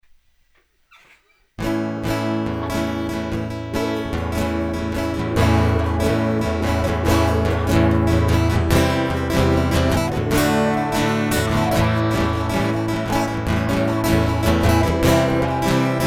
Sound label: Electric Guitar Interlude